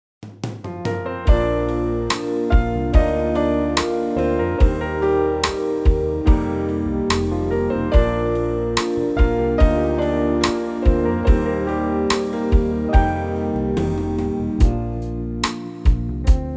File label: No Piano Solo Key Of Bb Major